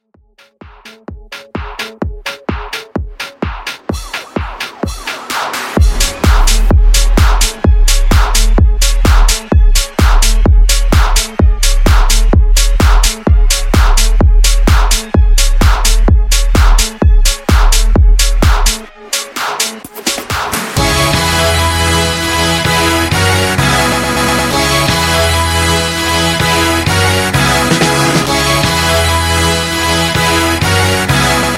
80s Bass House; Edit Dirty

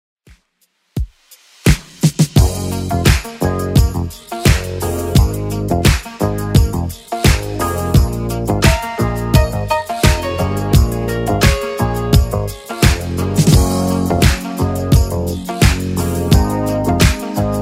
80s, 86 bpm; Redrum

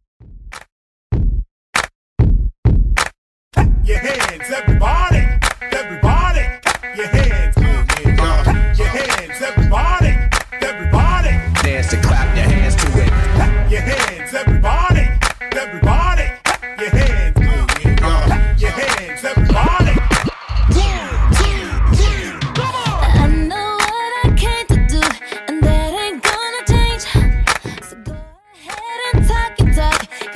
Mashup 98bpm

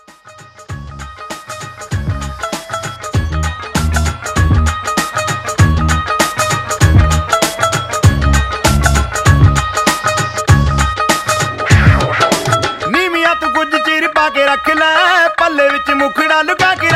Transition 98 132

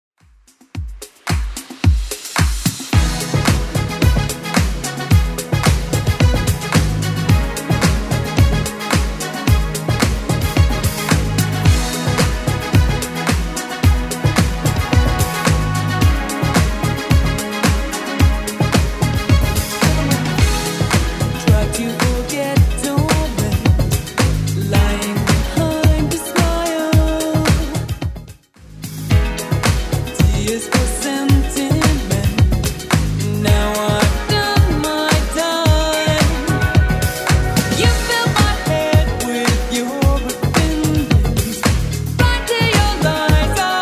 80s Club 110bpm

